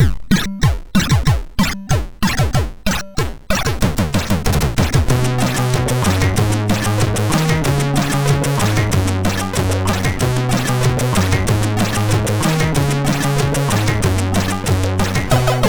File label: Tape Glue, sid MOS6581R3; Salthe Morten